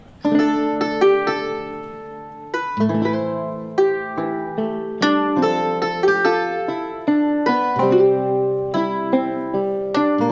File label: Song (Instrumental); Iria; Sword of Convallaria; Android